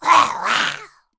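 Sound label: MON ATTACK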